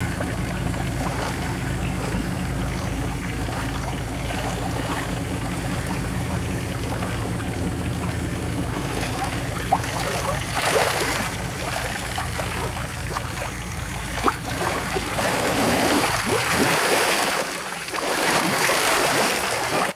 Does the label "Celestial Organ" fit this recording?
No